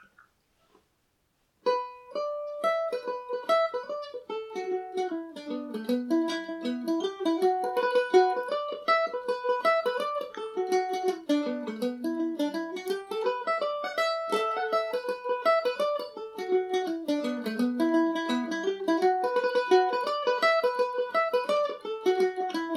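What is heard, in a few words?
E dorian, Irish mandolin; humours of lissadell revisited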